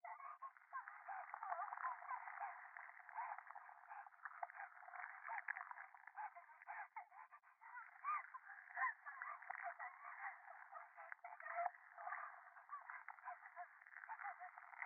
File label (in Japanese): 電話 可愛い喘ぎ 触手